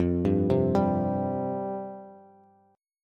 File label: F Suspended 2nd F Bass; Fsus2 arpeggiated; JGuitar